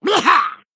male murloc, Attack

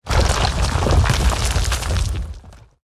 Rock Movement Underlay Play

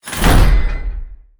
Attack

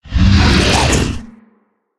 Attack

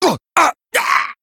Death

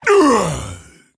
Male Orc Death

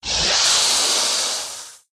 Deviate Viper Attack

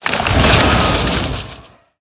Origami Dragon Death

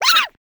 Attack

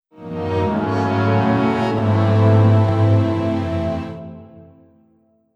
Play Stinger; Pyros

